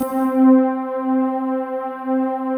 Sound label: PAD, Pads; Best Service; XX Large